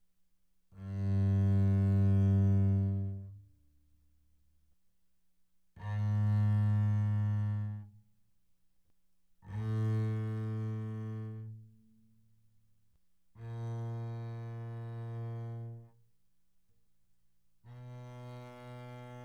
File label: Bass arco mf sul G G2 B2; spiffyscore